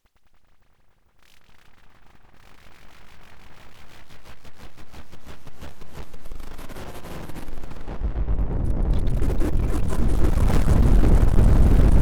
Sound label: delay noise; lyra